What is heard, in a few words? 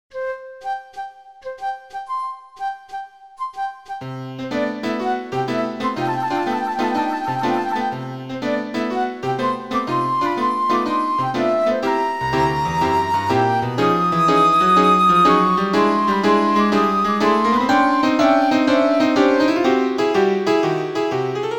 Suite for flute and piano; DW Solomons; 5th mvt